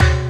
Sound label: 98 HALT SD FX, SD KIT, Industrial Loops; USB Soundscan vol.24; AKAI